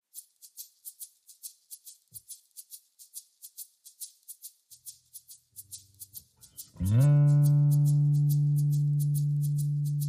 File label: Drums and Bass